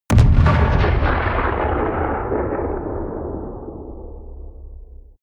explode distant

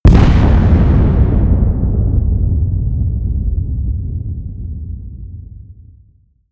big explosion

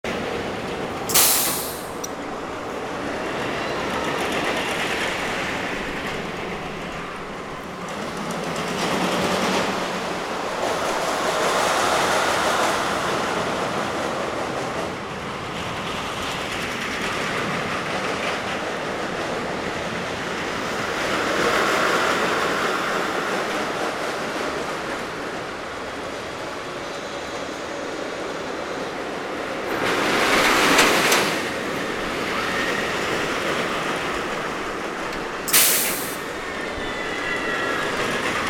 rollercoaster

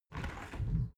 window open soft

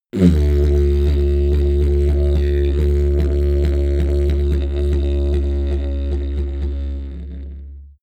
didgeridoo jump medium

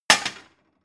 plate shelve hard

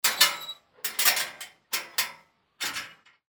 iron gate

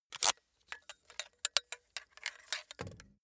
ratchet light rattle

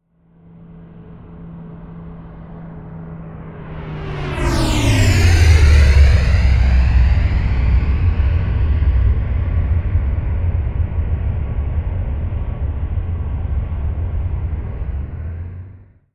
plane bombardier learjet flyby close

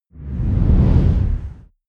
whoosh dark storm short

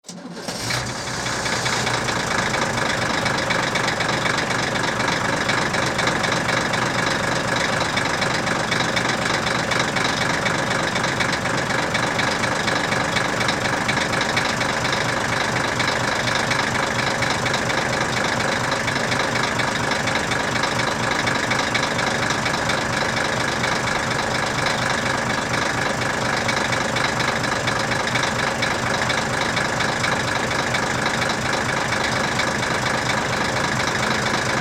diesel truck start and stop

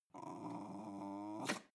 dog snarl snuffle

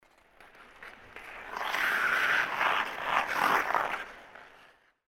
skating moves

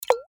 water drop

